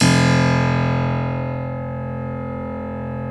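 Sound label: F0 clavinet right, Keyboards Acoustic; USB Soundscan vol.17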